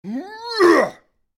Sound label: attack